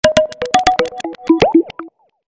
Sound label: htc notification ringtone